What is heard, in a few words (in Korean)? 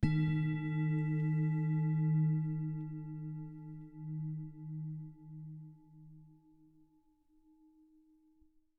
싱잉볼 사운드